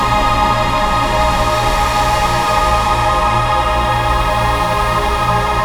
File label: pass, pad